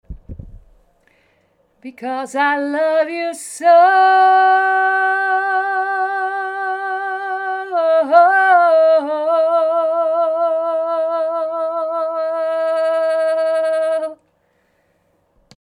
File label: Vibrato